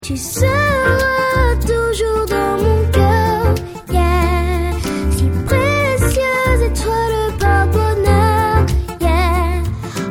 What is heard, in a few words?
acustic